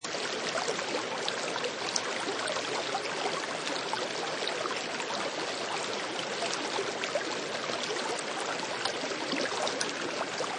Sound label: Gentle Stream Water Loop, Crystal clear water flowing gently; small river, brook or creek. Seamlessly looping sound; Forest